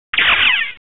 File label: getaway